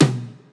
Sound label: percussion oneshot tame; Pop; Drums; SD PASADENA Pop Sample Pack; Slate